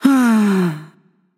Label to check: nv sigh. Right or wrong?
Right